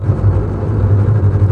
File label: TREM STRINGS